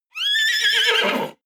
horse vocal neigh

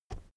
keyboard external space push soft

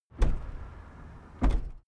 car lift down

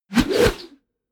whoosh air

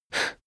male breath fast in

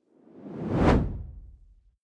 whoosh simple hard incoming

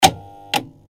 electronic switch

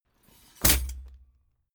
body drop chain leather bright

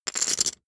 poker chip stack big rattle

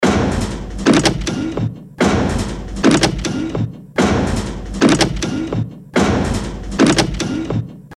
machinery loop

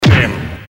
strong fist hit

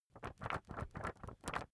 magazine wobble soft

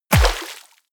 bullet large impact water

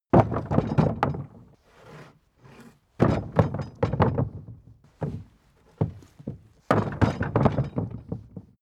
carriage hatch sequence

